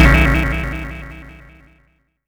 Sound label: Lead, 8-Bit